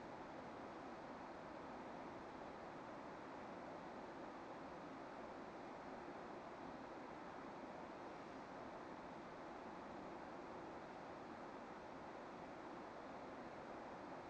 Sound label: room tone skybridge; corporate; Titanfall 2